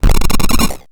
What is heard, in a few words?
grind, EMU XL-7